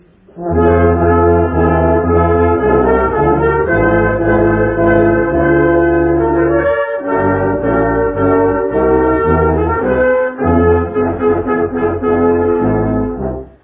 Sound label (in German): Menuett